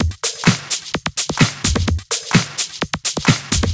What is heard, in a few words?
Houseloops 128BPM; Vengeance Samples